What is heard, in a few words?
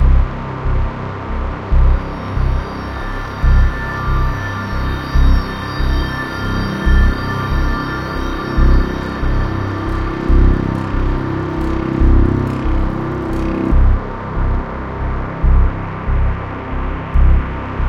ambience; Mirror's Edge (2008)